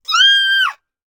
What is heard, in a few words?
woman scream; human